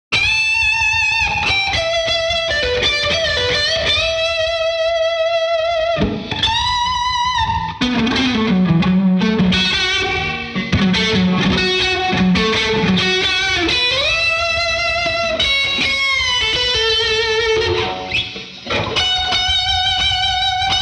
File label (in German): Solo Git